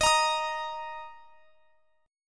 D sharp 5th D sharp; D#5 strummed; x,x,x,20,23,23

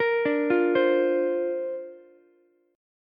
D-Minor 7th Sharp 5th, D, Ukulele; Dm7#5 arpeggiated

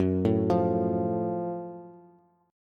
Gb Minor Major 7th Gb down Bass; arpeggiated; JGuitar

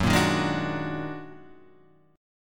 Eb Minor Major 11th

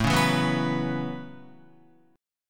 A 6th Add 9th A down Guitar; A6add9; strummed

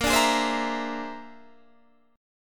Bb 7th Sharp 11th Bb; strummed; Bb7#11

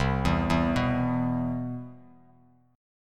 C Major 7th, Bass Standard

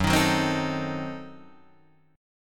Gb Diminished 7th Guitar Drop D

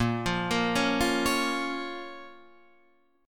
Bb Minor, Guitar, Half Step Down

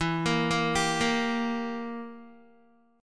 E Diminished E, Guitar; Edim arpeggiated; JGuitar

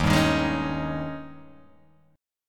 Eb 11th, chord; strummed; JGuitar

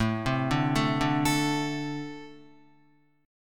A-Minor Double Flat 5th A Guitar; arpeggiated; JGuitar

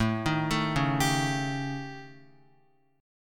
A 7th Flat 5th, down, Guitar; A7b5 arpeggiated; JGuitar

